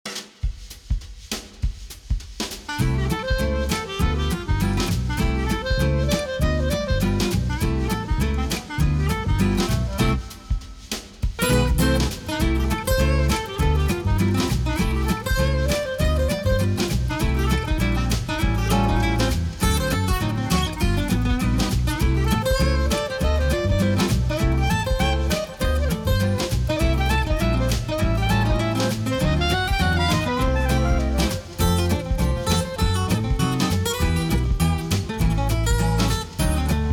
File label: Radio Music; Jurassic World Evolution 2; PS4; Windows; Xbox One; Xbox Series X/S